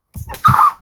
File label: buttons